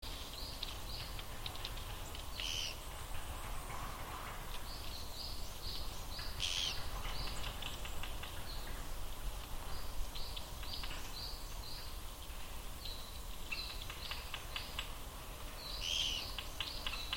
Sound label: kogera, Akasaka